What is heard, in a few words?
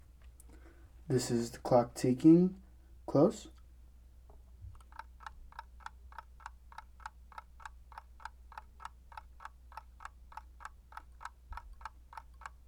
Clock Tick Close